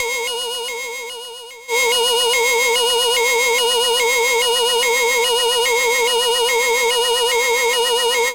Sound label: SCI-FI C4, SYNTH, Trance; AMG; Global Trance Mission VOL-2